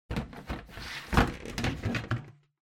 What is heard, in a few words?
Ceiling Light (Cover Remove)